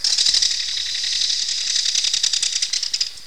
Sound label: Belted Kingfisher